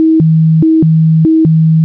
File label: alarm; SYMANTEC PROCOMM PLUS V4.8